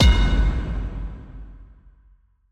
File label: Epic Metal Hit; soundscrate